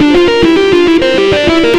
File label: xtra lead; Sonic Foundry (Sony Creative Software); Ugly Remnants VOL-2